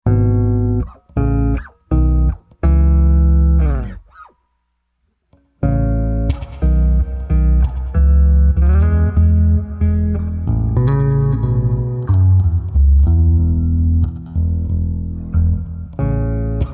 Hall Concert Bass, reverb

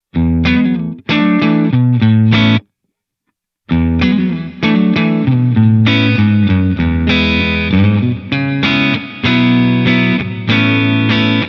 Plate Studio

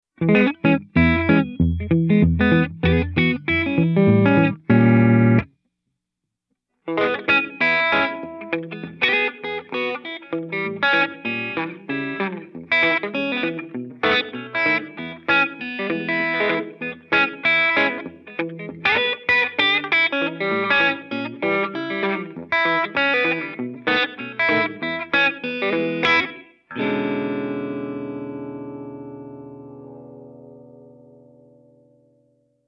LoFi 50s Radio Travis Picking

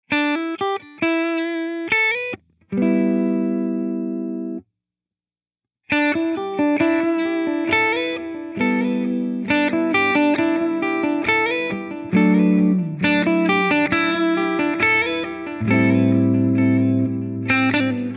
Delay Blendable Quarter Note

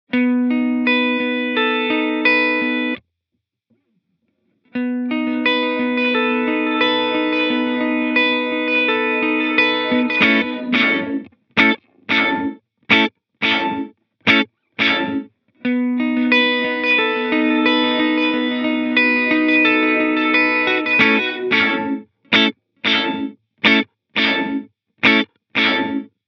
Filter Swhoosh Echo

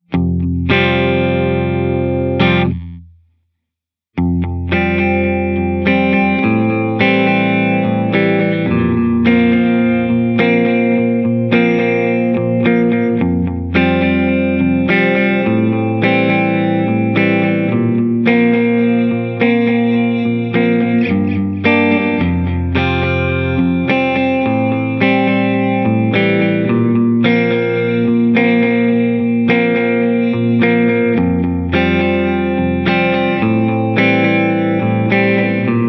Mod Panning Delay

